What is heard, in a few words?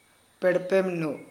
flute; Gutob